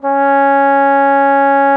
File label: TROMBONE C#4; Xsample VOL-3